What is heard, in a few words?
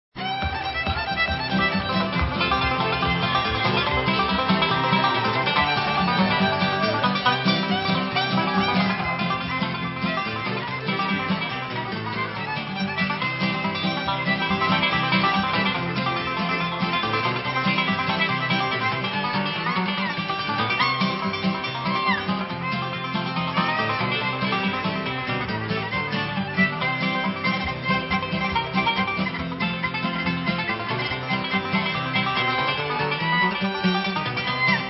Blues